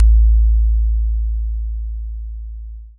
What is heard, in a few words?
cinematic hit